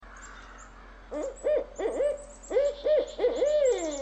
barred owl

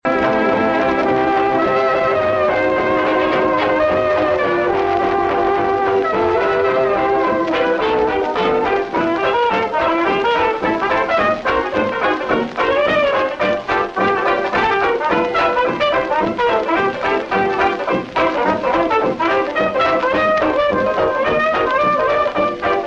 old jazz

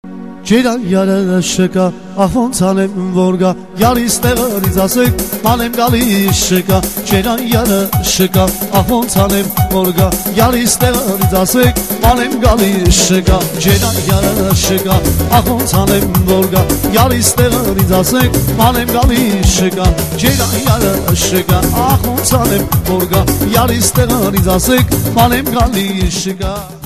armenian wedding

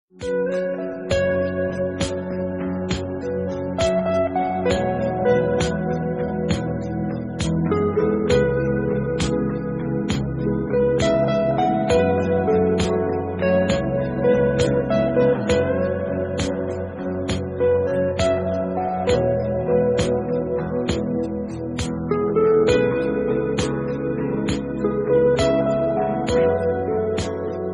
romantic love

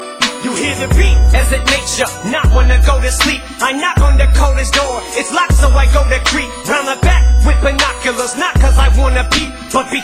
music box

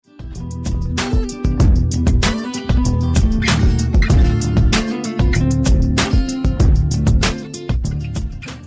jazzy smooth

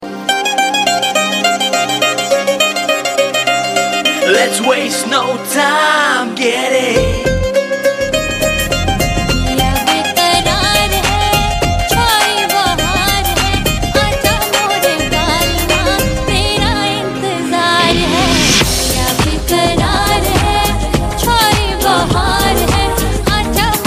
indian guitar

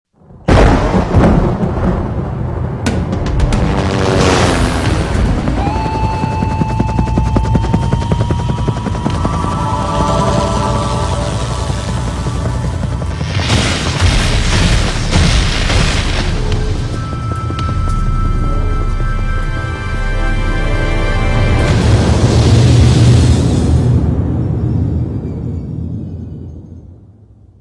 dolby digital city

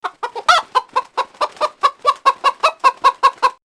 chicken

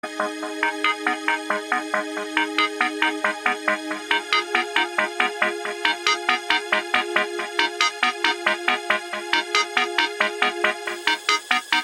piano

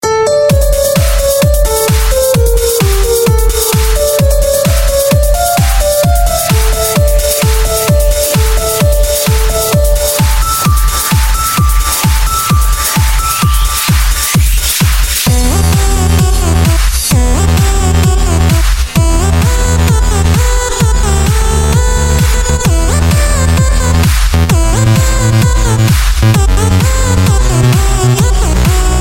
remix beat

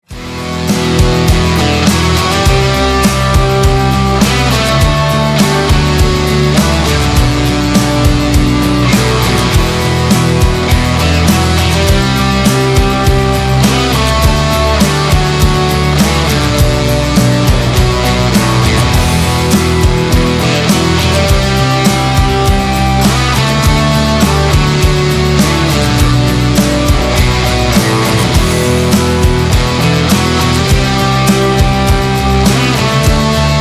instrumental

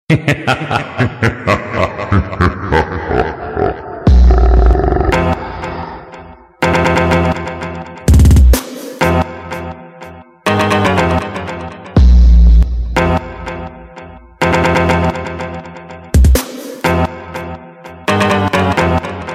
funk

